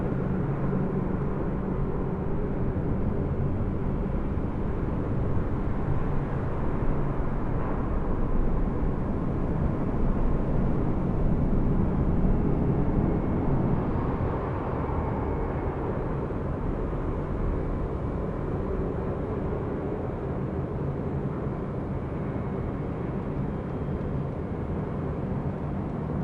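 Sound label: ambience exterior Cavern; Boomtown; Titanfall 2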